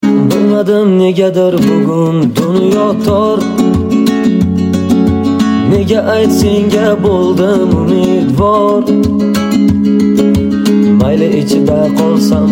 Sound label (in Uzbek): gitara